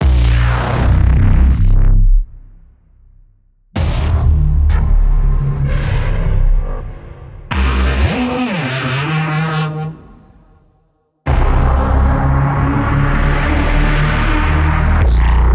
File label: EPIC BASS BENDS